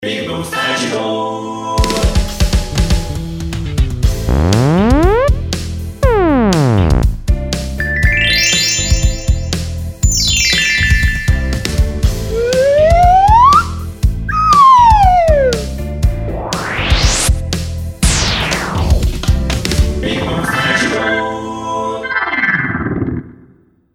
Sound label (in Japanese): 効果音素材集 ワイプサンプル; ぴぐみょんスタジオ作品紹介(効果音素材集)